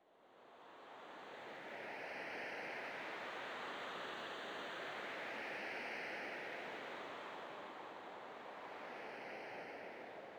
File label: sting gentle wind; Rust